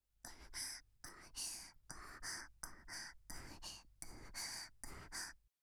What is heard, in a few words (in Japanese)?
無声音喘ぎ